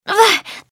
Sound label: groan; pony; Twilight Sparkle